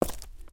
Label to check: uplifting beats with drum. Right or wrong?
Wrong